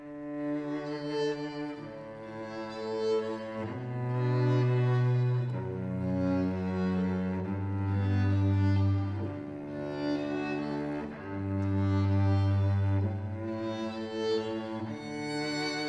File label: String Trio Ensemble